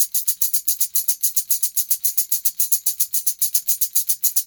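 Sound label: tambourine, Loops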